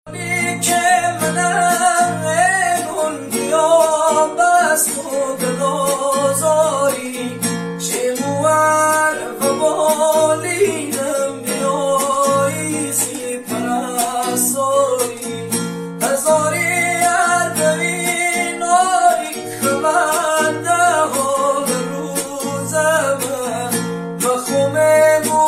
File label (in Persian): gitar